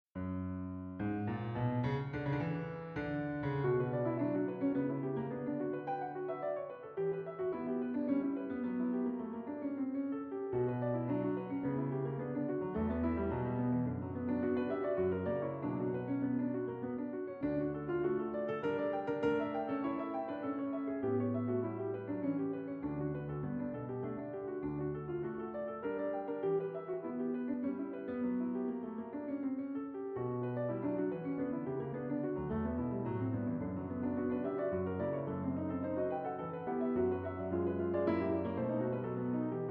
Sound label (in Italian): Elegie per pianoforte; Rockel Eduard